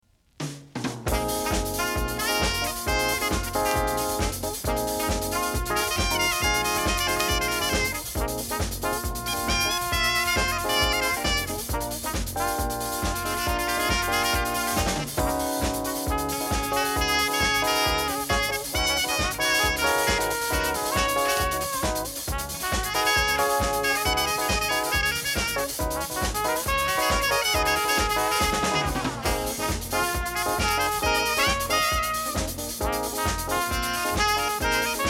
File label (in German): Tanzrythmen Disco